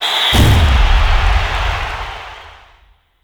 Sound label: Charging Rush Charge; Warframe; Infested Lich; Hard Drive